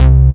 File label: Bass C, Offbeat Bass